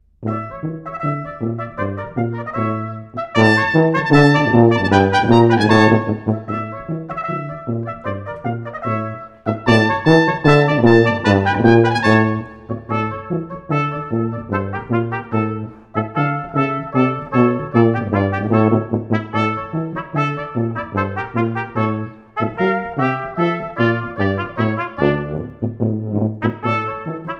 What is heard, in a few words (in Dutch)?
karaoke; lespakket